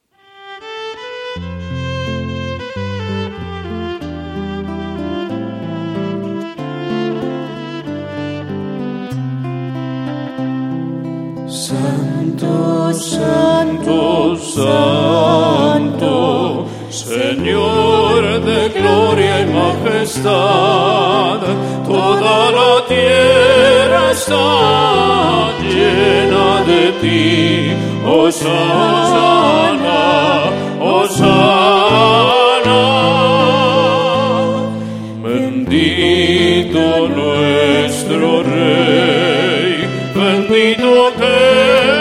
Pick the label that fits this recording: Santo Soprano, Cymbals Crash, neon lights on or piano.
Santo Soprano